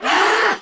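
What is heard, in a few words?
DEATH; giants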